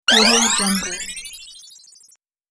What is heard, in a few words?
hyperspace spell